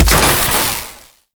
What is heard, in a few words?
Core Electrical Explosion; Ambience; Warframe; Corpus Gas City; Gameplay Remaster